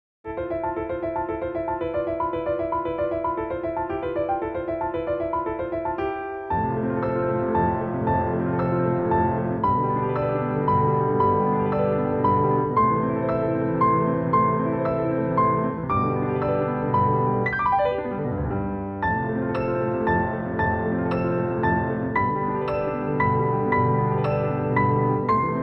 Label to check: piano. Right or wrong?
Right